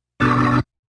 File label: organ